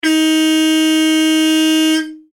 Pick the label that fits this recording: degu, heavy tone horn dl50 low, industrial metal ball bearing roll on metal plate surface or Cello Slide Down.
heavy tone horn dl50 low